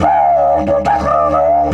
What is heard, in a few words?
EF 140, DIDGE RIFFS; Big Fish Audio - Didgeridoo and Other Primitive Instruments